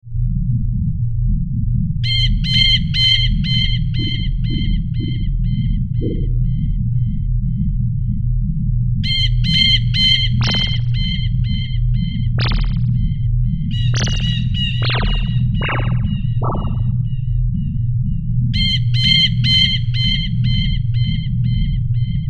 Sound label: Birds